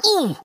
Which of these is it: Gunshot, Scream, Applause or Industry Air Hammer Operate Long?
Scream